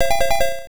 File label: Credit SFX; Akazukin; arcade